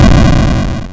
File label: Hard PCM Chip; chiptune